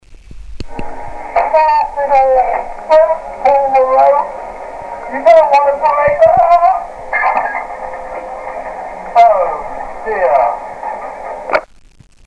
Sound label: well drop